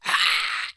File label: daemon die; propack; magic